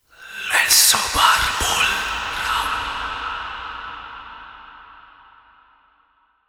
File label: Processed Battle Shouts, Viking Vocal